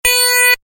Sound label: single beep; Quicksilver; Drag Race